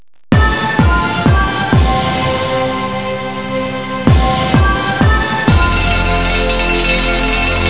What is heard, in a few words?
TECHNO